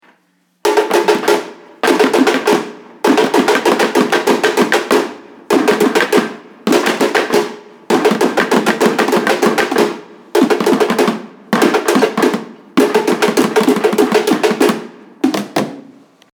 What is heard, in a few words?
Batucada